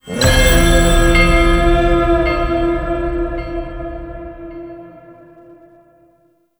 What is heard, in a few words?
Offering Ready; Warframe; Cetus; Cetus Invasion